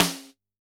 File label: Snr, drum